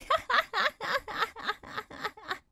laugh; pekora; M8; random and out of place

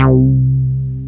MOOG; Rubber Chicken Software Sample Bank

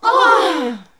NOISE, CLASSIC VOX; Best Service; Hallelujah

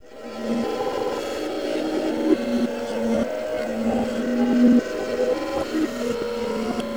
ACID MBIRA; Distored Reality

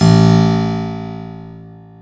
KEY HRPCR, Roland; Roland - LA Composer vol. 1

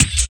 RSS, 160 BPM

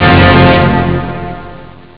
trumps; Amiga Soundtracker Sample Packs

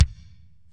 bdrum, Kurzweil K2000

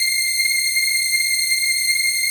PAD, Dance Mega Synth

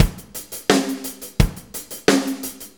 4-4 Loop, Drum Loops, Full Kit Loops

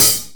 HIHAT A#5; Greytsounds VOL-1; SDX HATS+CYM

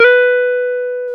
Jazz Guitar

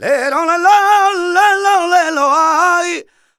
male cantaor vocals, Flamenco

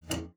PLOK D5, SPINET; Xsample VOL-7

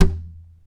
TAP, FLAMENCO Guitars

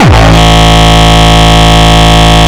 Longkick, kicks

